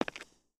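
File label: Foot Concrete Sneaker Walk, footsteps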